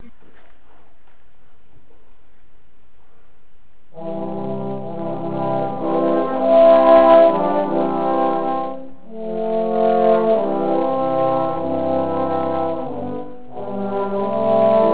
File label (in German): mendelssohn, posaunen; kilchberg